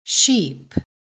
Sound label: Sheep; filmora